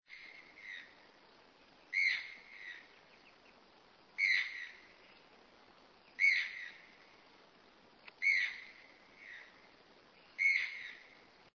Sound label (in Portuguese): Ramphastos vitellinus Tucano de bico preto